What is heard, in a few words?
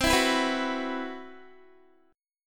C Major 7th Flat 5th down Guitar; CM7b5 strummed; JGuitar